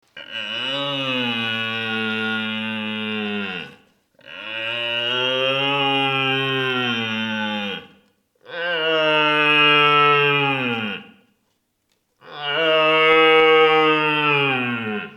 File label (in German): junger hirsch